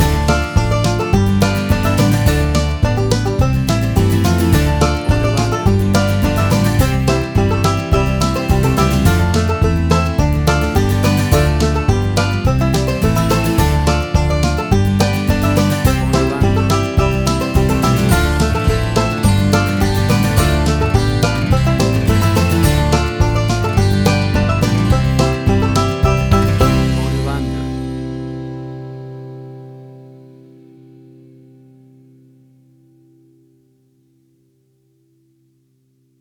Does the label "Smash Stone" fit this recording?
No